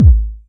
kick drum A key, kick drum single shot tuned to the A note, Loudest frequency: 102Hz; kick drum samples; drum single hits one shots